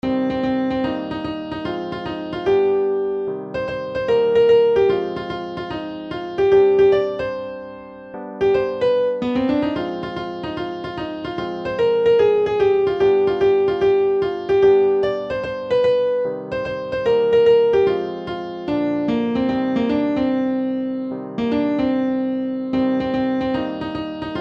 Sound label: keyboard; Don Chuck Monogatari